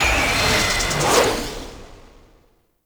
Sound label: Roar Attack Start, Infested; Warframe; Zealoid Prelate